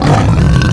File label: wound; The Monster Plane; kolmods preatt